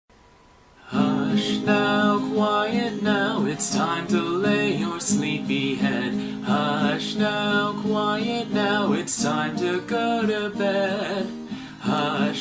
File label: Guitar Cover